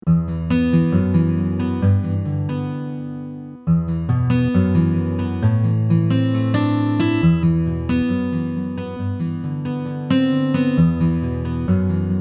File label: Pure Acoustic